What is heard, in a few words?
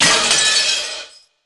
GLASS CRK